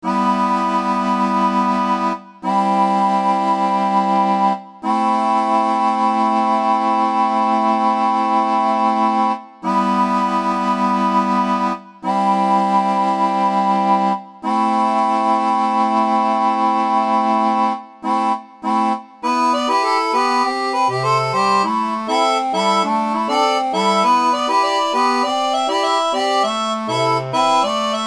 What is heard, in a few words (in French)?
orgue barbarie